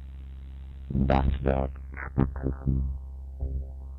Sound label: spectraphon vocal spectr; Make Noise